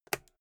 old switch plastic robust

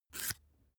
match light fail

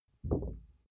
paper wobble low gentle

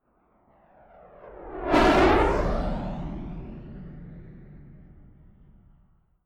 rocket maverick flyby whoosh fast close

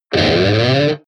electric guitar distorted slide up

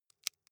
wood chip small break creak soft

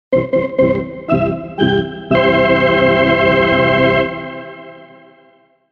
funny organ motive

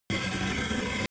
medieval slider move loop

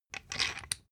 thread screw remove

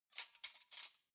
cutlery knives handle

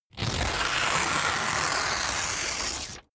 sheet clean tear up slow

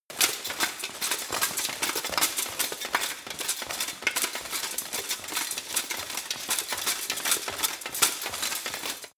run

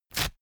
paper towel roll sheet tear up fast

